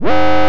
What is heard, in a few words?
bomber warn; spoonman; dota; crownfall